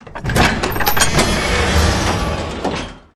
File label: very large door; svencoop